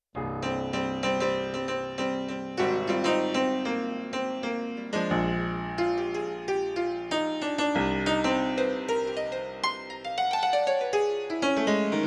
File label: 61 Key Low, Grand Piano; Yamaha TX16W; Yamaha CFIII Grand Piano